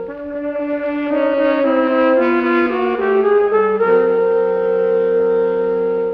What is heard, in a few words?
horns, Sad